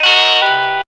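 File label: guitar chord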